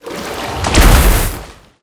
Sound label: attack; Liquimon; SwampOfDarkness